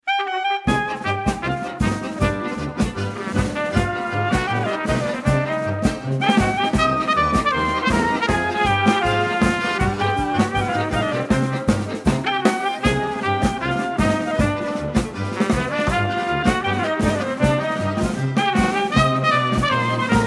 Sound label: klezmer minor bulgar